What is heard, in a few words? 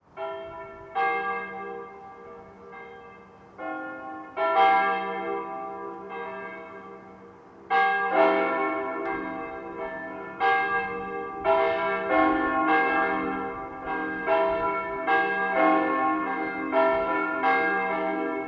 Binaural City Church Bells Ringing Celebration; Ambisonic Spaces